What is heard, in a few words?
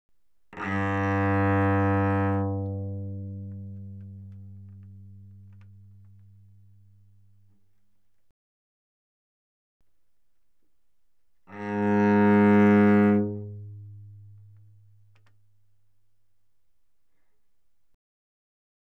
Cello arco mf sul G G2Bb2; Strings